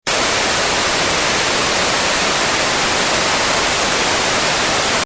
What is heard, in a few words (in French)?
bruit blanc